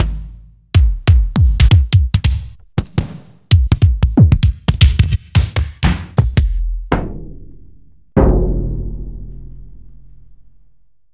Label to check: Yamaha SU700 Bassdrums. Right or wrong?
Right